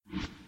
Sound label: rotate; connect hexas